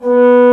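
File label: Trombone mf B2; Digital Sound Factory Virtuoso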